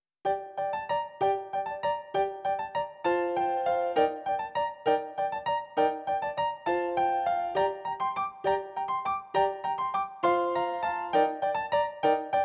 Sanctuary Piano

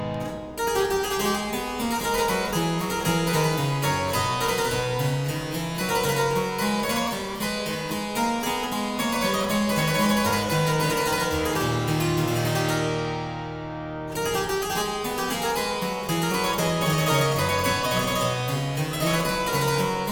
Courant simble in A Minor, Harpsichord

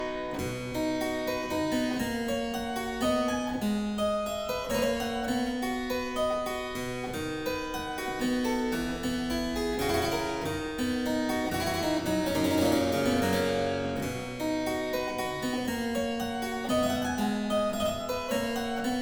Suite in C Major IV. Double, Harpsichord

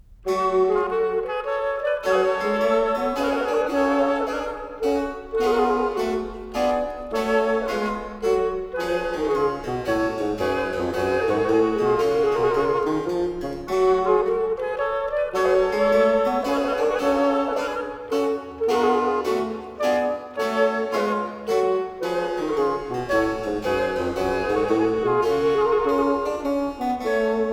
Menuet en Trio; Baroque; Couperin; Concerts Royaux

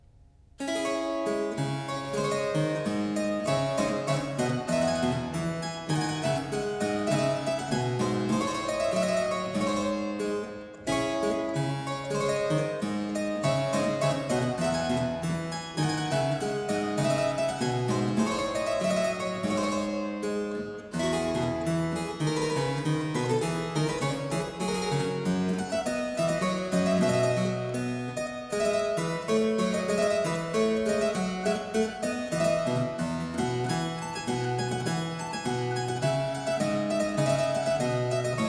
Aire, harpsichord; Complete Chamber Music; Brilliant Classics; Miscellaneous pieces for the harpsichord

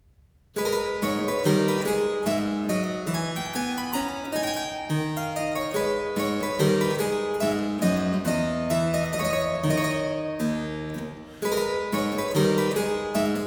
Minuet, Miscellaneous pieces for the harpsichord; Complete Chamber Music; Brilliant Classics

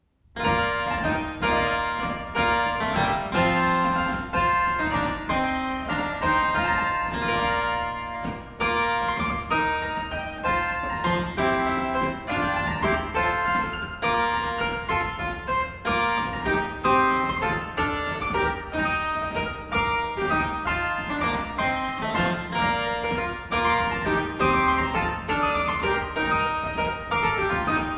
Corranto (harpsichord); Byrd

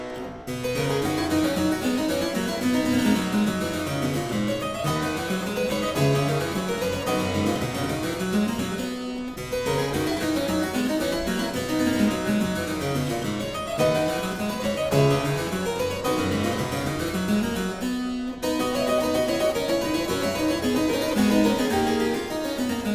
Aria Variations in C Major, Harpsichord; More Palantino

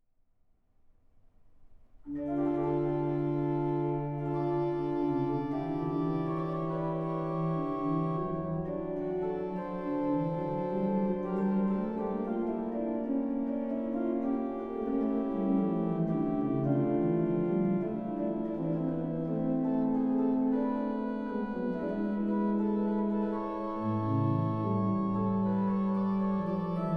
Prelude in D minor, Organ; Bach; Christopher Herrick; The Complete Organ Music; BWV539 Prelude and ‘Fiddle’ Fugue in D minor

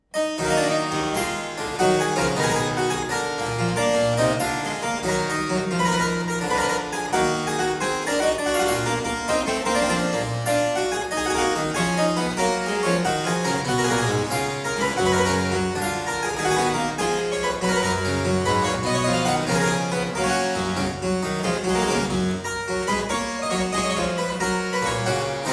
E minor Gigue, Harpsichord; Buxtehude; Complete Harpsichord Music; Auf meinen lieben Gott; BuxWV179

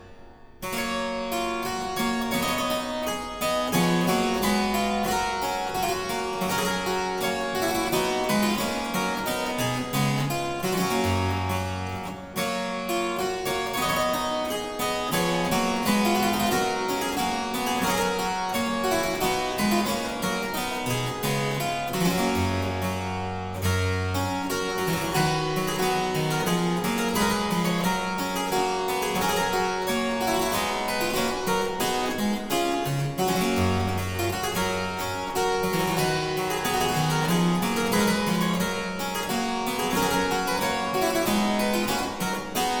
Aria Variations in G Major, Harpsichord

